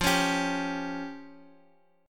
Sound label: F Suspended 2nd Sharp 5th F down Guitar Open D